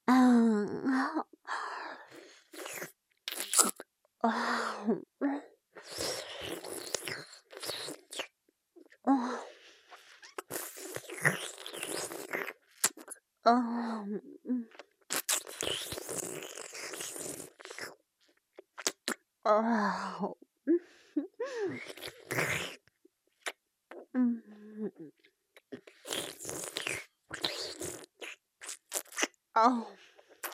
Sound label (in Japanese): フェラ音ループ, ASMR